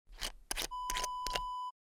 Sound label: small glass bottle open